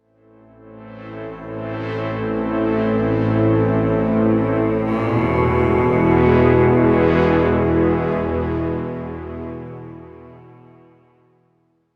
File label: Back To First Temple 68BPM; Doctor Who The Edge of Time